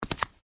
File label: Flip flops single step on concrete